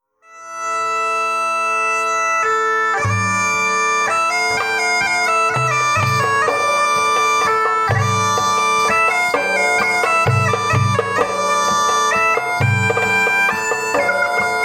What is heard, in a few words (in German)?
Schneiderpfeife, Dudelsack